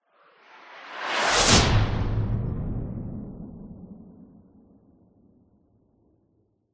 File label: science fiction space fighter fly past fast